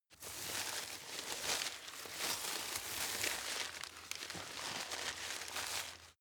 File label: grab crunch long medium